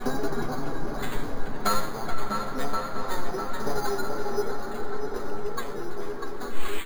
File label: techno pad 140; Techno2; Keys